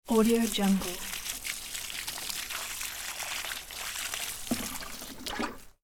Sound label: watering plants with plastic can; domestic sounds